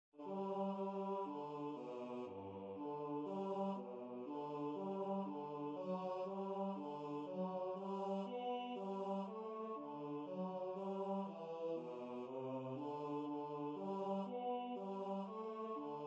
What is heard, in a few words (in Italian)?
coro bassi; Idomeneo